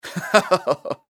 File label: laugh chuckle male